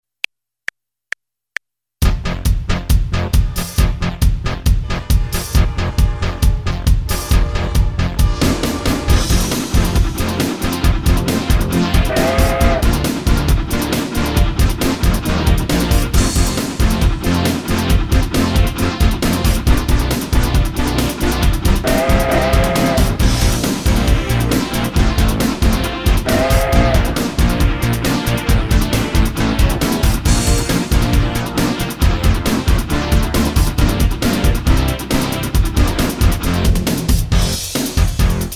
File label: Railroad instrumental